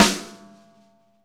Funk Set, Akai MPC-500

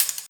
HH, Drum & Bass, 160 BPM

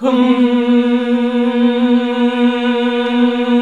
HMM B1, CLASSIC VOX8

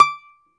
DOMRA D, Prima Domra, Plucked Strings

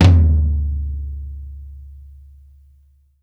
FLA FLOOR, TOMS; SW TOMS

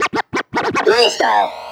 Vocal Scratches 140BPM

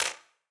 Wider Clap

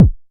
Click; Clicks

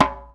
JMBFNK, djembe; Univers Sons; Basicussions

